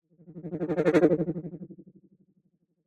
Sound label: transition synth delay; sonniss gdc